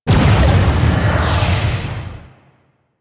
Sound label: Corpus Powerplant Final Explosion; Ambience; Warframe; Corpus Railjack; Tether Power Plant